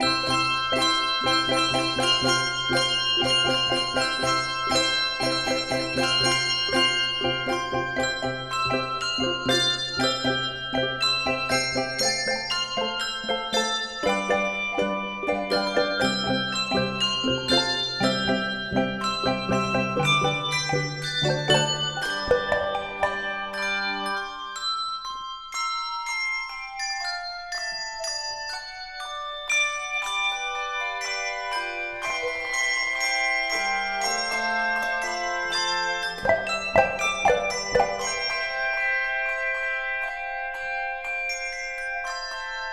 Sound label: Handbells 3-5 Octave